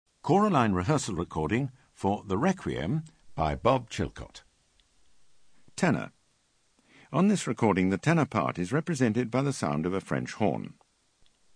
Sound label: Tenor; Chilcott Requiem